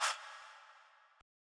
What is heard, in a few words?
Chant